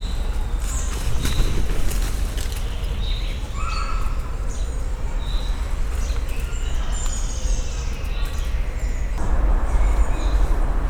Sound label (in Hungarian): szarnycsapasok, szirtisas; vagott felvetel; szegedi zoo 2011